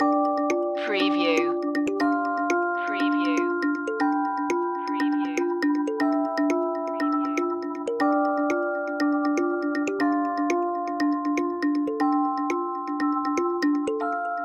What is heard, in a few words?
loop, inviting